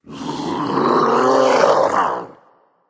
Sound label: battle cry; cerberus